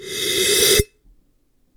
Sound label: Inhale With Glass